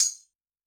Tambrn, Yamaha DTXpress-II, Perc

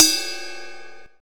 RIDE BELL D#6; Greytsounds VOL-1; SDX HATS+CYM

